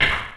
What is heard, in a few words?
hit; blockofighter